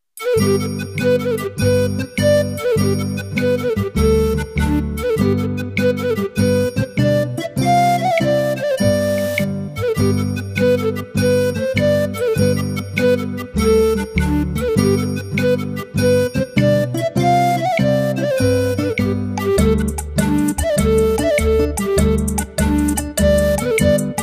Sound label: A Stall in a Market Square